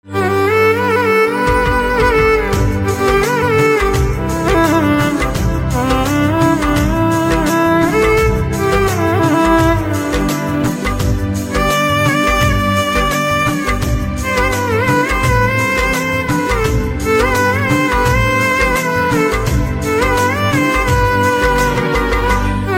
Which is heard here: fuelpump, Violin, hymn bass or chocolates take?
Violin